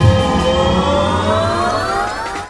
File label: Kaos FX, Ambient Grooves; Sonic Foundry (Sony Creative Software)